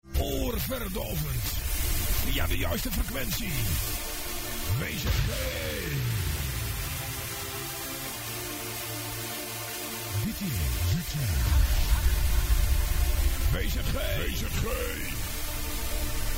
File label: Jingle; Firma W.Z.G.; Audio Fragmenten; Arkan Radio 2